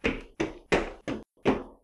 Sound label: Stairs